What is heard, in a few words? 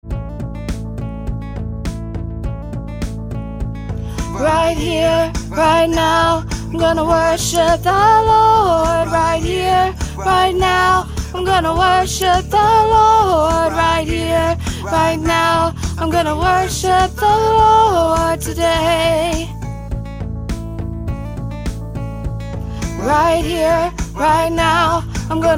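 Soprano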